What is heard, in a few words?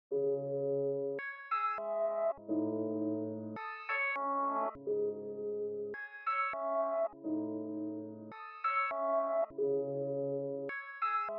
Piano; Boomin-Beat-Starter